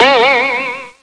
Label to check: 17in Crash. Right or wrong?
Wrong